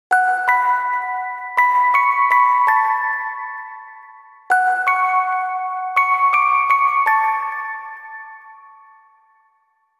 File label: Music Box; Grimoire Song; Rune Factory Frontier